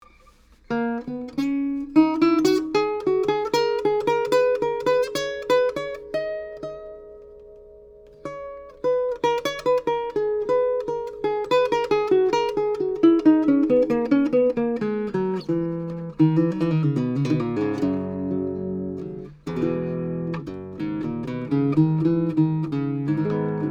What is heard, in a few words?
Baroque Guitars; Mauchant; Mini K47 mics into a Trident 88 recording console, using Metric Halo ULN-8 converters